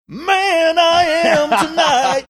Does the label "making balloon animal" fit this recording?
No